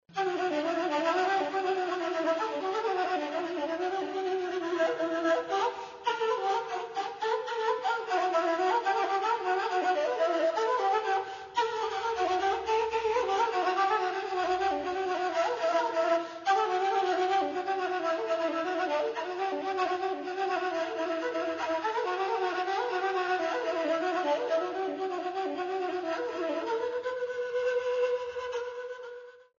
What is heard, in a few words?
Kaval Solo